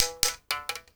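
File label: SM MOUTH BOW; Spectrasonics; Heart of Africa 2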